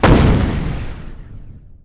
shield; NanoFXv2; ExplosionFX; ExpShield

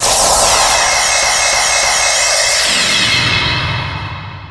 Junk Beam

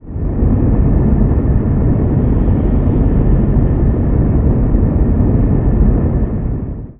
Player Vent, Plasma; SpecialFX; NanoFXv2

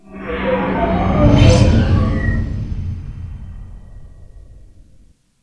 Default Exit Warp; NanoFXv2; WarpFX

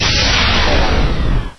Inversion Beam, Weapons

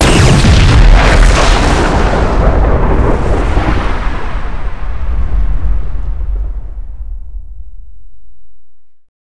nova, Explosion; NanoFXv2; ExplosionFX; ExpNova

